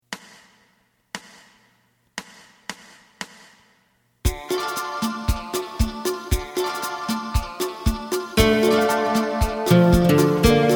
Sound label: bass